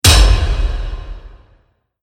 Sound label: Hits Hard Hit in Forest